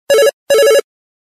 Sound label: midi ring; LG enV2